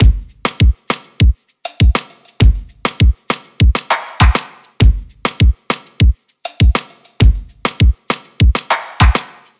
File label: drum loop 100, Pop; Drums; Full Drum Loops; Slate; SD PASADENA Pop Sample Pack